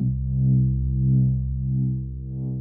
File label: bass loop